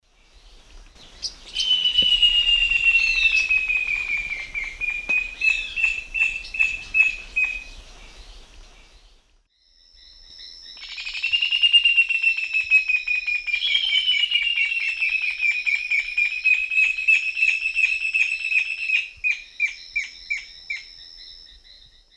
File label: Furnarius torridus, song and duet; S. America; Eastern Lowlands